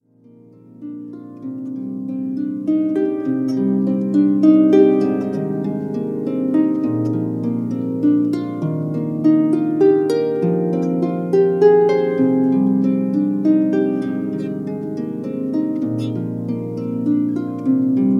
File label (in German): Herbstwalzer Harfe